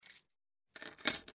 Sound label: drop key